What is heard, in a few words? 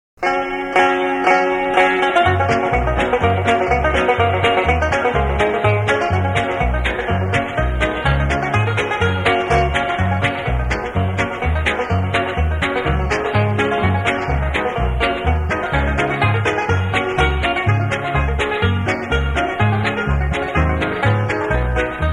instrumental